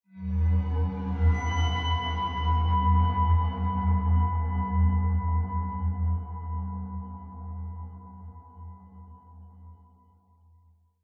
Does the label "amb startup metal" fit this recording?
Yes